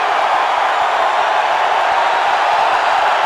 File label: BBall Crowd Cheer Loop; SFX Kit; Crowds